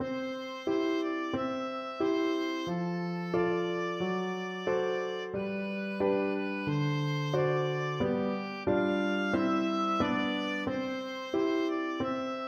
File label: mozart don giovanni, oboe